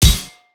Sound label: hit